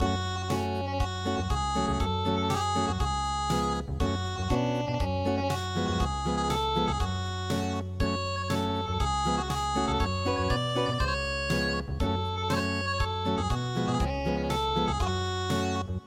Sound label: unpluged